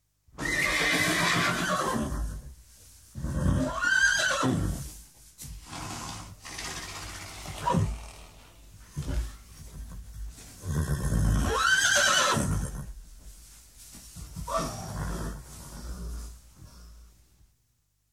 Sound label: cavalli horses; animali